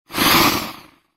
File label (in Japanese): モンスターの鳴き声